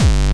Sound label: bombo, kicks, Hardcore